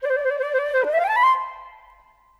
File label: Flute; Sonic Foundry (Sony Creative Software); Orchestral VOL-2; Modern 14